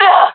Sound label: death, fem; player vo jungle fem